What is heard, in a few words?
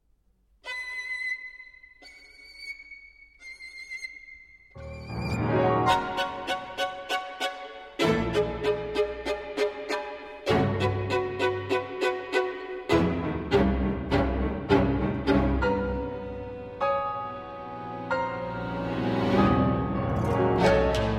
Double Concerto For Violin, Piano, and String Orchestra with Percussion